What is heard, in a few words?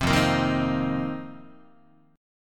F 7th Suspended 4th F down Guitar; strummed